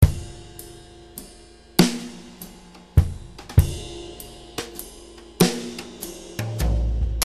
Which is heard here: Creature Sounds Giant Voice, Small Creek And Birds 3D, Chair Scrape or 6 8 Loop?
6 8 Loop